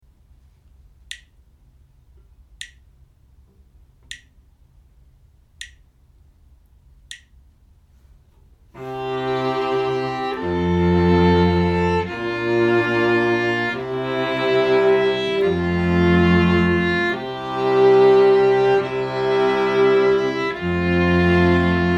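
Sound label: harmonic dictation, string quartet; ear training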